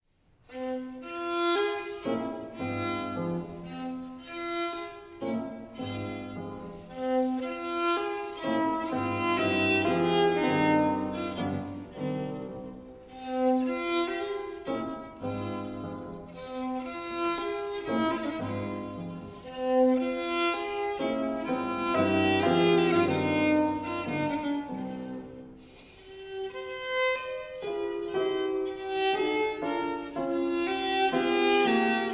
sonata in F major andante

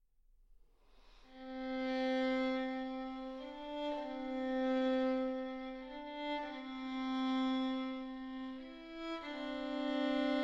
violin sonata in c major adagio; violin sonata no. 3; bwv 1005 i

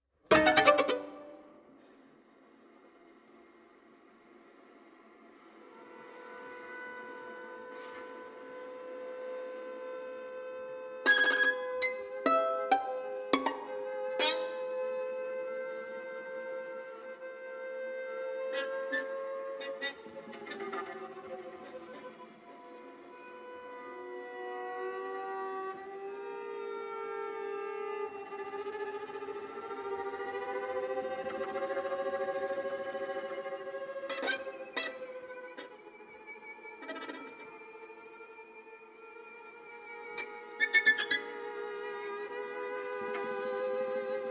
string quartet; String Quartet 1; 5 Experiments in Living

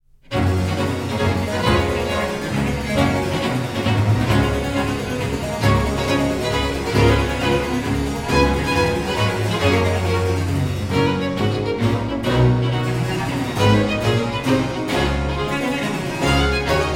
concerto for cello in G major, allegro

